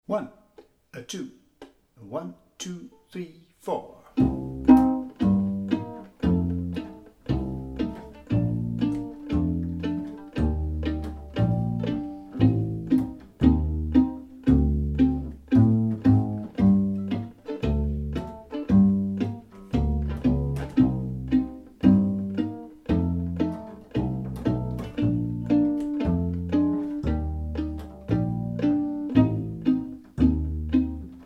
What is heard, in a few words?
backing track